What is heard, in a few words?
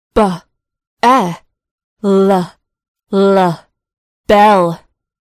bell